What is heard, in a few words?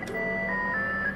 intro beep long